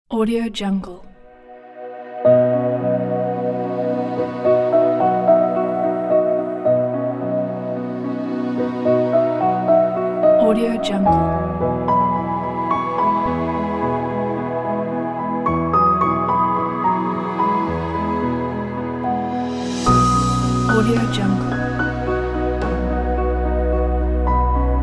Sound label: happy wedding